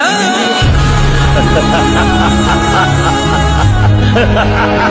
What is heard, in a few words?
jailer laugh